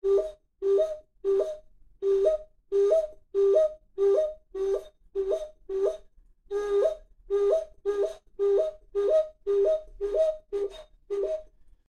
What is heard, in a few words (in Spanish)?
cerdito